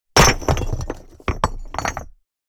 Small rock bricks dropped on rock; impacts

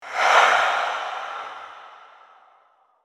Vampire dark presence wind

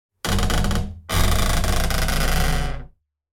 Designed cinematic wood textures pressure bends

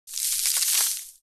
Drop a handful of sand

